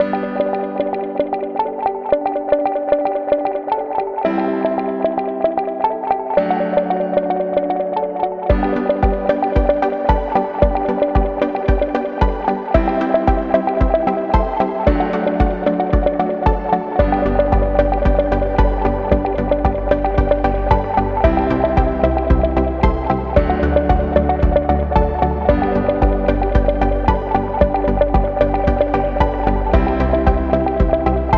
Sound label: Positive Minimal, corporate music; corporate music vol 16